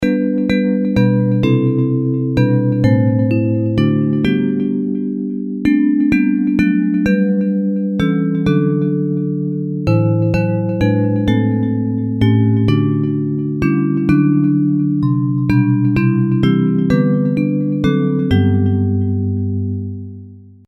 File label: Bells